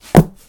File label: Arrowstorm; gamerip